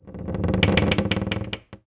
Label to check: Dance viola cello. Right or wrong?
Wrong